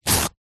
hospital blood pressure machine arm band velcro rip